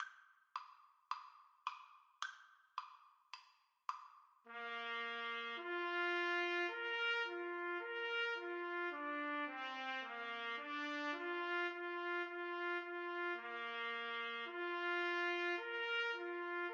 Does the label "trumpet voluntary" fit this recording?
Yes